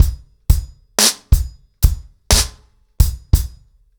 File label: 90BPM, acoustic drums, drumloops